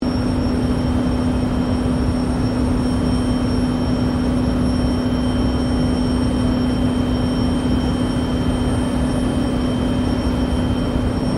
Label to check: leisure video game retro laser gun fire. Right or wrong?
Wrong